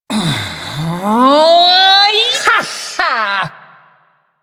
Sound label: Ability Lift Laugh Corpus Lich; Warframe; Persona A